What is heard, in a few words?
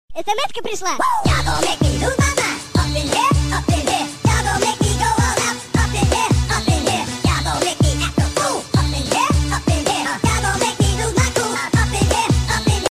kids msg ringtone